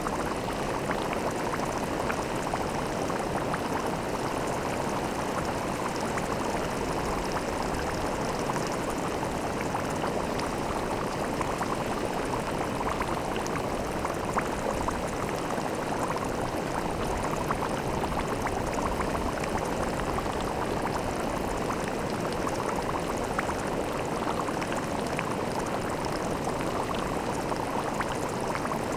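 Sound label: Water Bubble Water Boil; water sounds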